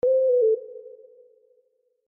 Trap Whistle Text

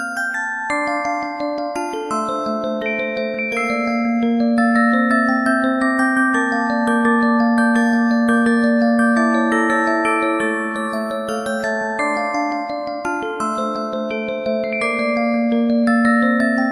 Musicbox; SAO

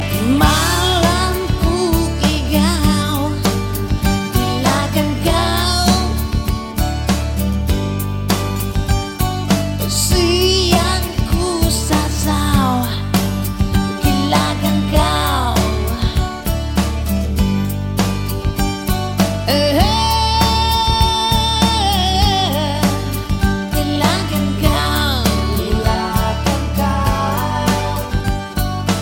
lalalala

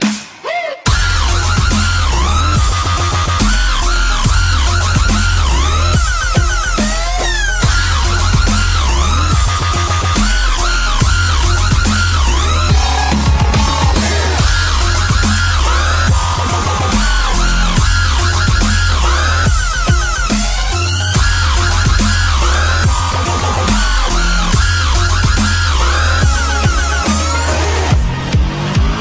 dubstep

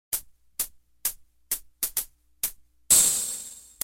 Jazz SMS

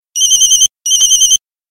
Standard Msg

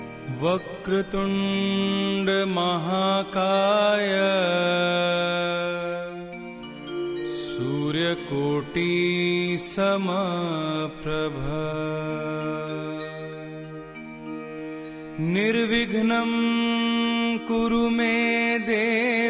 ganpati tone

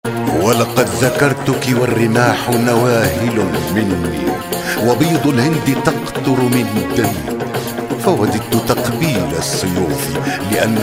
arabic

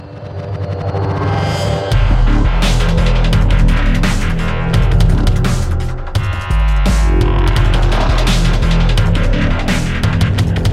Drop War Drums, ringtones

